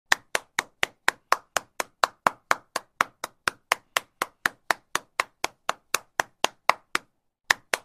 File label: slaps